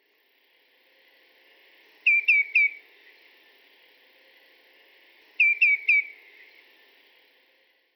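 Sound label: Common Greenshank; aoashishigi; oyama; koe; hirano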